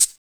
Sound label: RSS PERC